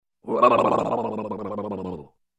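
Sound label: shook head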